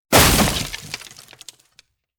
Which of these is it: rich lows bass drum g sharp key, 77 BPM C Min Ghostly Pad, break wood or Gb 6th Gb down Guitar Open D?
break wood